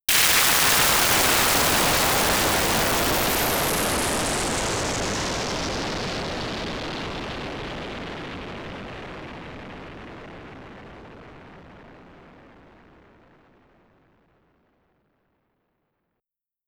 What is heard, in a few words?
SizzleTest, SH101